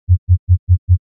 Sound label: sine bursts 90Hz